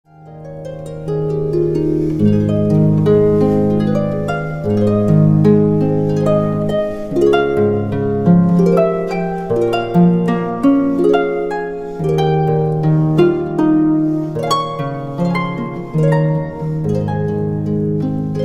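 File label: The old rugged cross, harp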